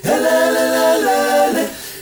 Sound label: HELELELE, Heart of Africa, CHOIRS; Spectrasonics; Heart of Africa 2